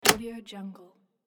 door lock

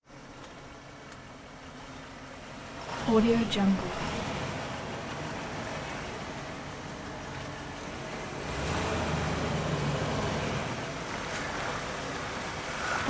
1988 lincoln town car

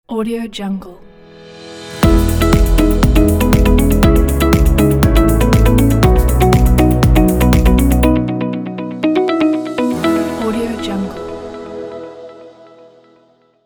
company logo

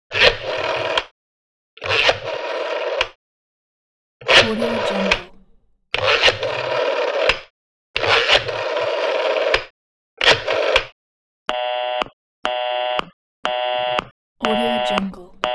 american phone dialing with engaged tone

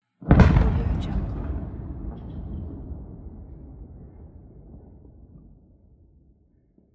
explosion

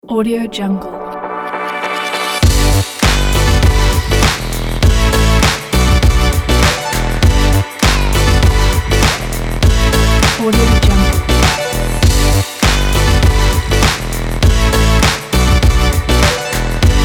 electro fashion fun sport

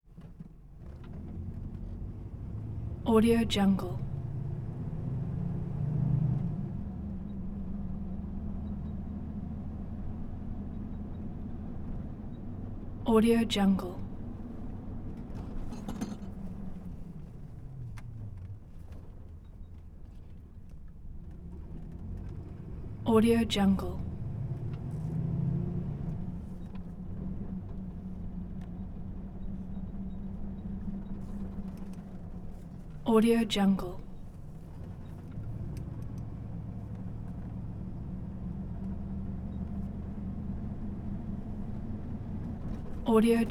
1988 gmc suburban

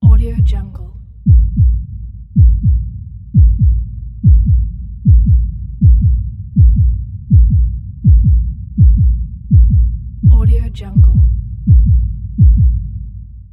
heartbeat accelerating

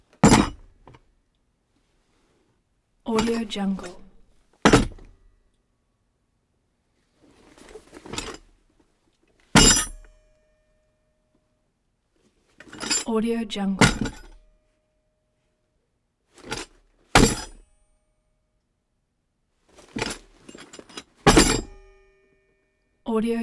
tool bag drop canvas put down on wood loaded

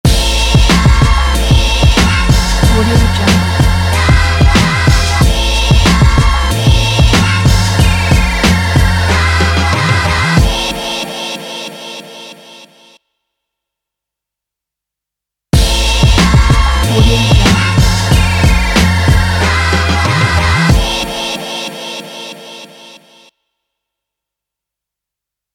positive happy hiphop logo

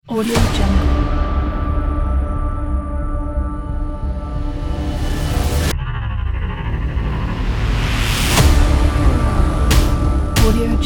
epic trailer intro ident

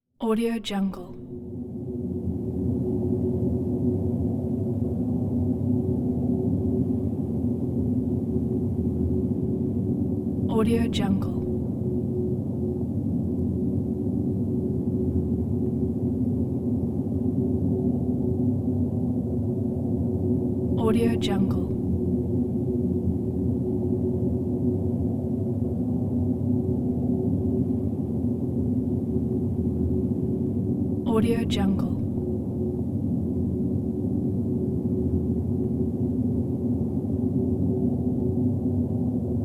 low wind rumble

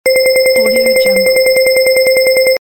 point counter

